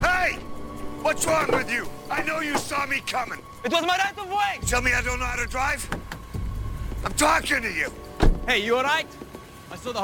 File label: car accident